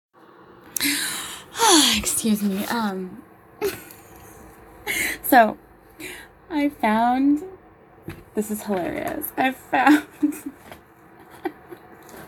Improv laughing; goals

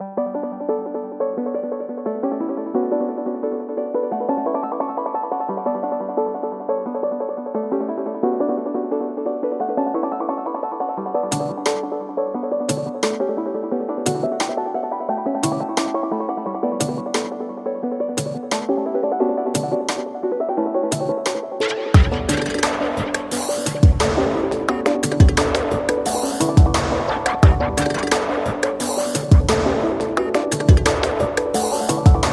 The Dive Game (Ambient); Pony Music Archive

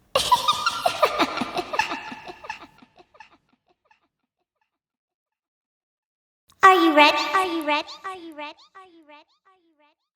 cupcakes giggles spoken

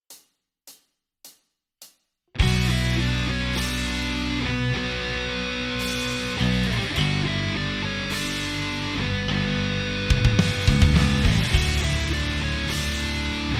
(instrumental)

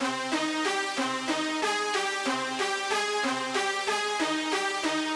Main Melody Brass 93bpm

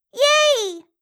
yay

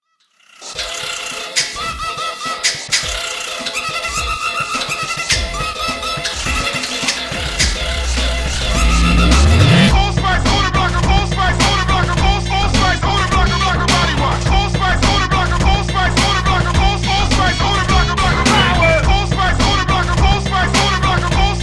Dubstep

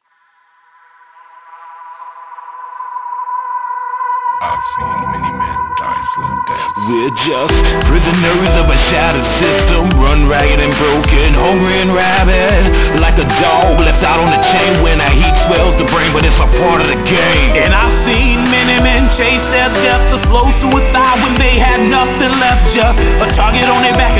slow death

